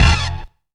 SCAREY STAB; Zero-G; Funky Elements

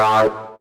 acid A, synth notes; Techno Trance Essentials

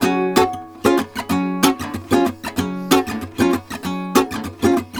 GUITAR, Balkanish Traditions

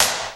SD, DRUMS; Crypton - DrumBase5000; CD DRUMS #1

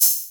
CR-8000; Best Service Gigapack 2

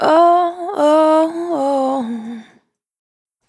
Spin Dry, Single Voice Melodic Loops

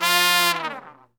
F2, section horns

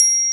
Carillon C6; Proteus 2000; Digital Sound Factory Proteus 2000

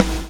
BLOW; Spectrasonics - Backbeat

